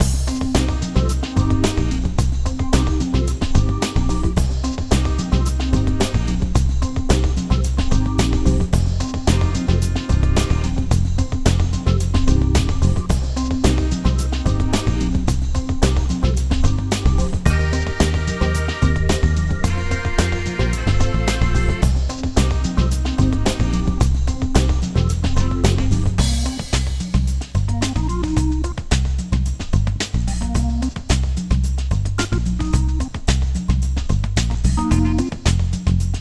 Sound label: Action; Schlag den Raab Das 2. Spiel; 3DS